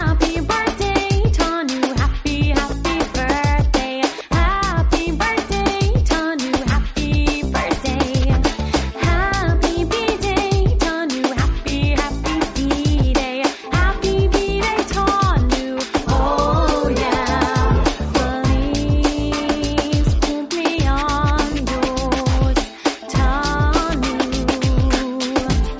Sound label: happy birthday, birthday song